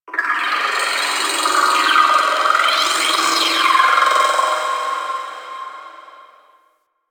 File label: Orphix Shield Pre Disable One Off; Warframe; The New War; Sentient Devourer